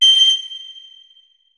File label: flute notes; Hideout Furniture